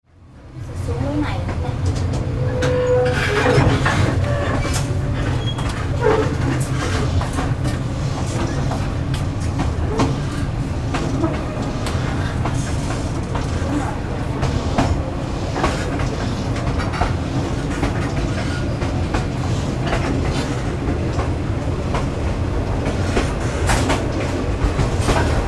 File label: train cabin inside wheels squeaking